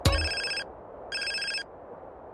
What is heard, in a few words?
phone ring sound